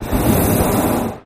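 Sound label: Hot Air Bllon Burn Me, Hot Air Balloon Burner; Medium Hard On, Off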